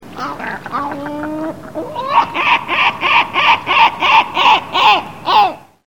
Larus marinus

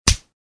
punch

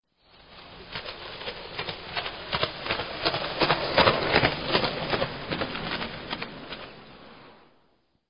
Skateboard Passing

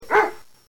bark

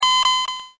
beep

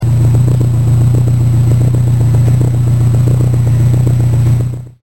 R1 idle outside

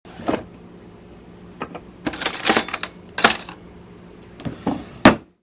kitchen drawer

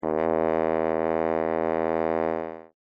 French Horn ff E2

